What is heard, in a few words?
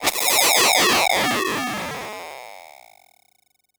alert; One Shot FX; Sample Magic; Transmission-X